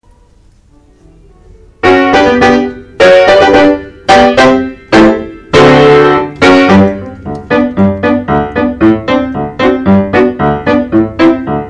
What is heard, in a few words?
piano